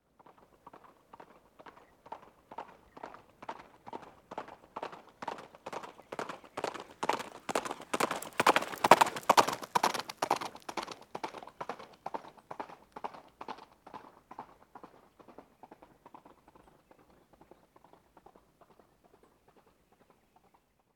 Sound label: Horse Gallop on Gravel